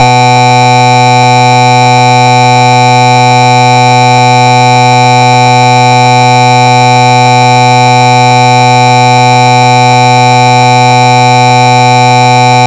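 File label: Interrupted fast horn, signaling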